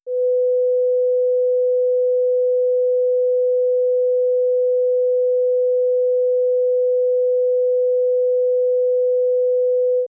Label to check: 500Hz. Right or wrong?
Right